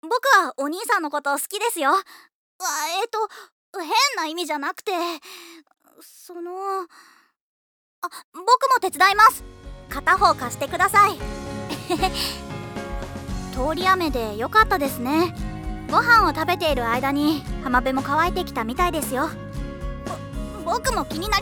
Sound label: cel, voice